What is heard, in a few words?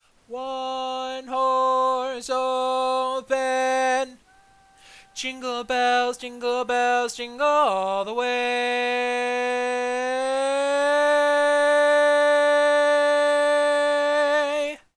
Lead, barbershop tags